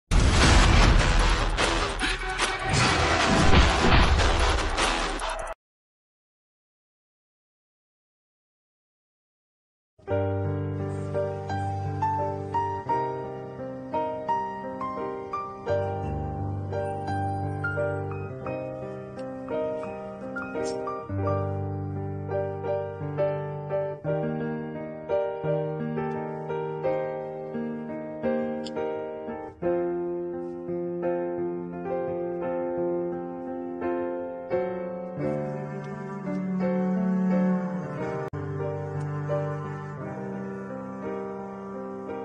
sad bgm piano sad; movie; maestro

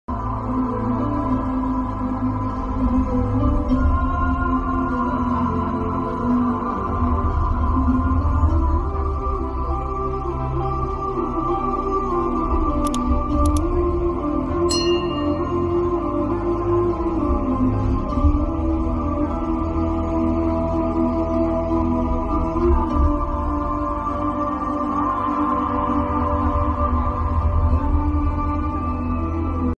interval bgm ringtone

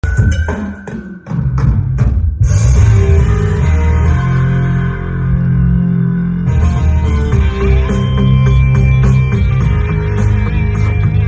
climax bgm ringtone; animal; animal park; ranbir

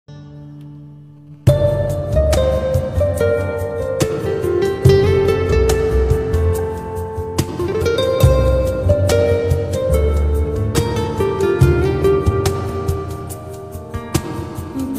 piano ringtone slow reverb

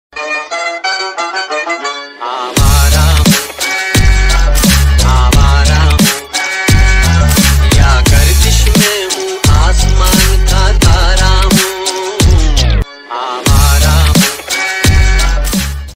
english ringtone bgm ringtone

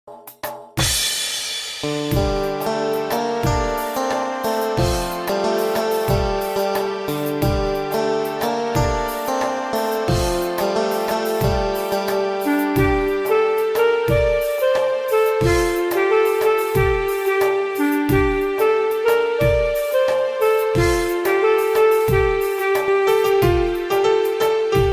instrumental ringtone banjo ringtone

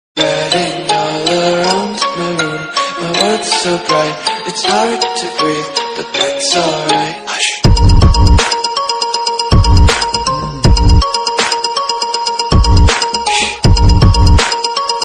trance ringtone bgm remix bass boosted

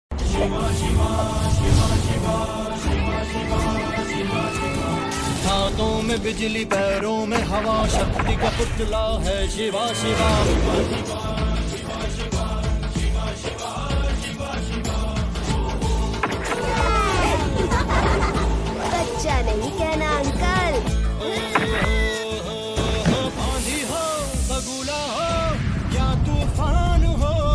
cartoon ringtone; shiva

